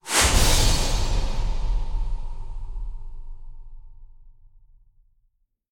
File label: Stinger Death